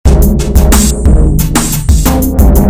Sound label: Loop